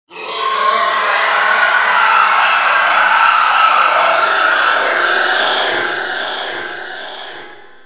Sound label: howl